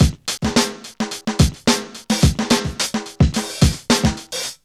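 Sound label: 108 bpm, Funky loops; Zero-G; Funky Elements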